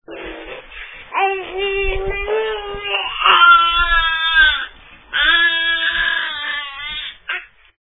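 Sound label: razz and groan; January 23: Razz and Groan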